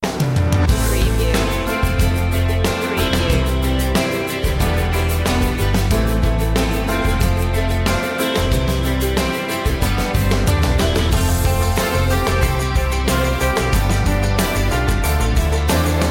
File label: open door